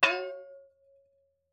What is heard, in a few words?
metal bowl ding; soundscrate